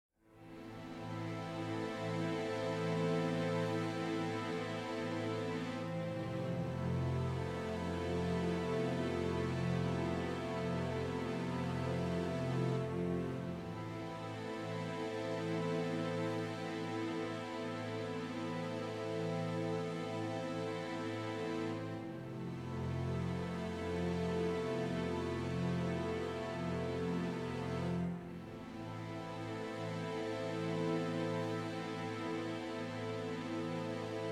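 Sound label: Strings & Brass; ProScores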